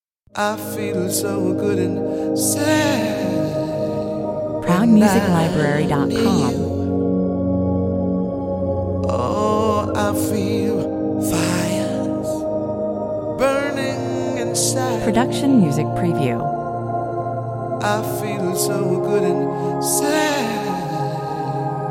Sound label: commercial